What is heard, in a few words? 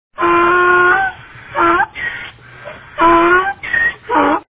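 donkey; osel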